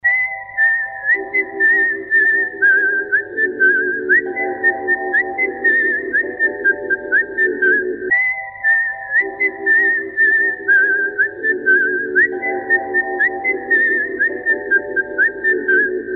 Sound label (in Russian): svist; Kill Bill